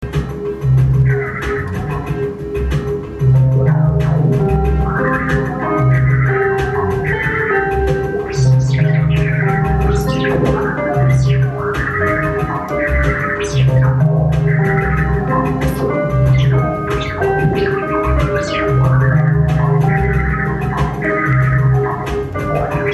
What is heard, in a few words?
analog key fade; Pete Ippel; Live cut using a Yamaha synth I got at a garage sale in Morris, Illinois